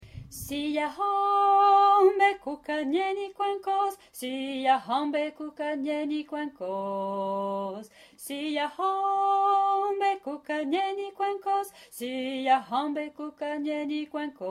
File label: siyahamba basse